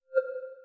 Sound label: press